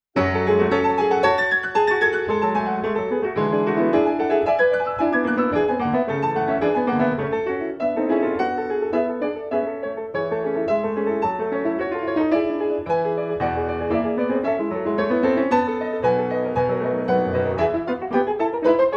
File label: Toccata